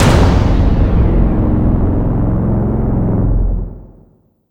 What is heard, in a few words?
Complete